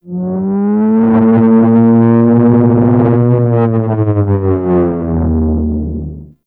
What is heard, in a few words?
Terraforming Egg Vocal Dry; Warframe; Tau